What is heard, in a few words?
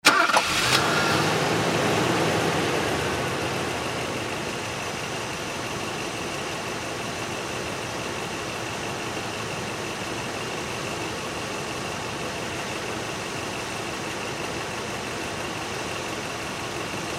mercedes start and stop